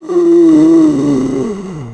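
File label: zombie select, creature; GammaAge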